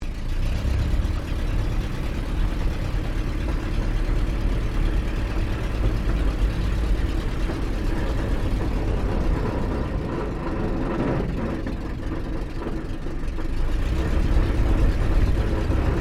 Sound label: AIRCRAFT DH82A DEHAVILAND TIGERMOTH TAXI INTERIOR; RAAF Tigermoth; Airplanes; Vehicles